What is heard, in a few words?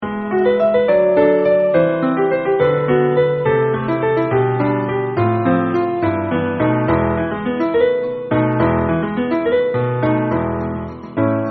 Pian Solo; Los Pastores